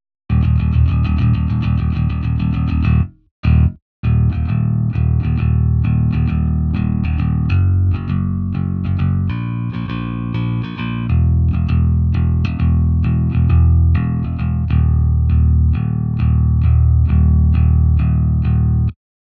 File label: Clean